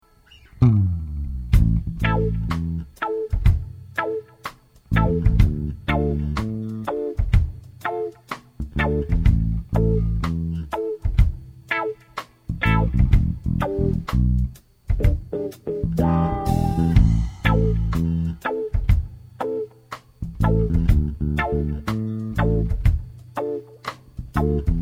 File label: REGGAE